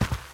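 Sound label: unpleasant sharp sound of TV interference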